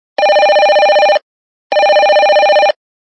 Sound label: telephone electronic